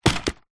household vacuum cleaner dyson v7 stick set down carpet